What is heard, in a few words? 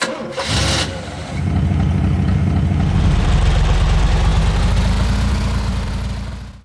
thunder odjezd; Mafie 1